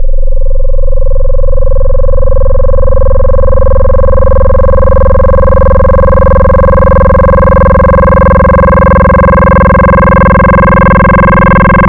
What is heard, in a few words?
Purring; Cymatics; Jawz Wavetables; Serum